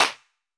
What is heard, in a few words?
CLAP, GM drum set; AKAI S-Series CD-ROM Sound Library VOL-8